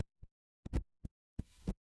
transition glitch

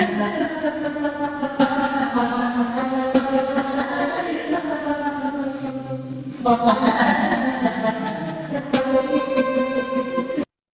crowd laughter

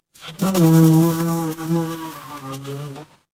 animal insect

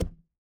ui drag

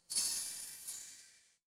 magic scifi heal

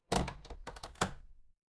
door open

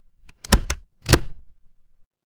office stapler

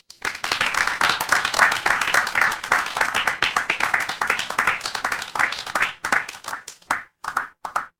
crowd applause

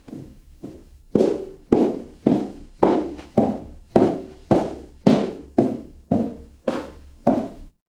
footsteps stairs

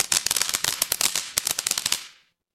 fire electric arc

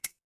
glass glass tap

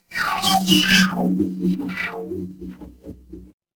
magic scifi alien

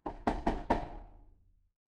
door knock

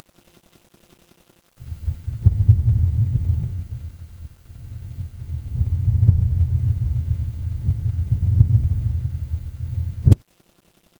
ambience room tone

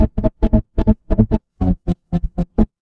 magic scifi shield

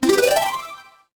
retro game power up

